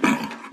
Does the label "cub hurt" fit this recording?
Yes